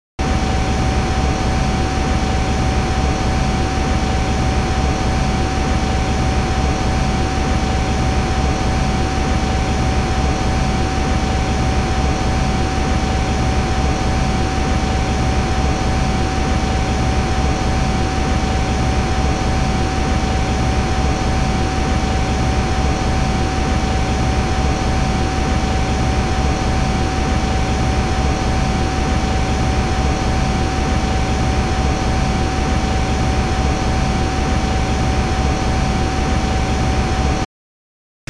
factory machine running

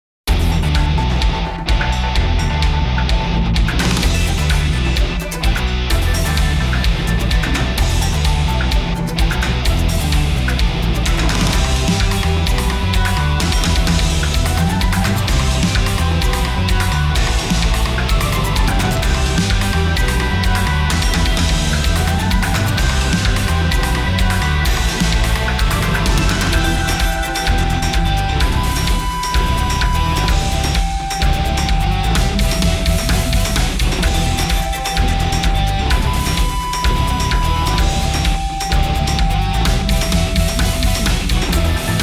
Guitar remix; Kamihime Project; 降臨戦 通常戦闘 1

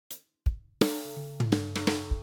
Lounge Intro; Metaverse Keeper

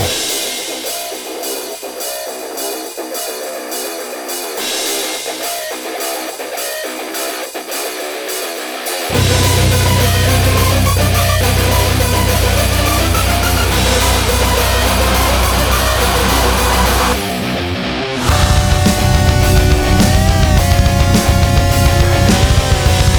dungeon boss; LFH dungeon1 boss WIP

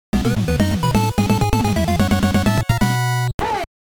Scene Clear; the cowabunga collection

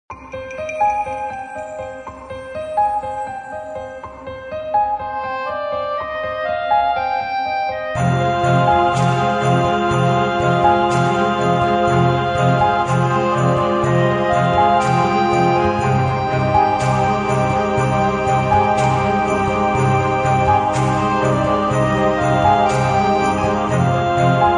dungeon relaxed; Chibi Knight

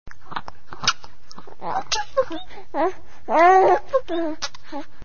drinking; Dora

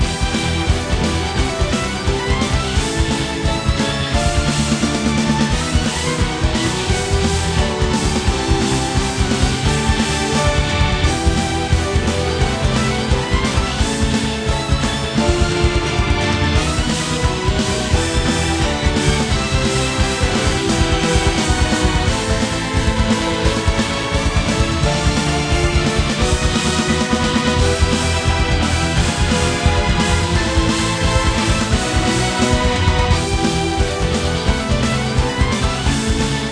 Battle Loop

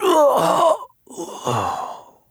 Phoenix Death; Counter-Strike Global Offensive; PS3 Windows Xbox 360

